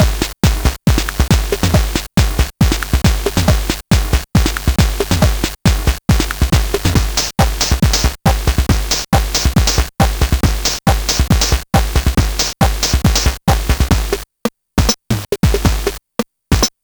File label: Nintendo Gameboy LSDJ Loops